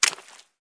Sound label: Single footstep in a puddle